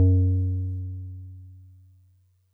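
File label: DigiPianet DX100 F#4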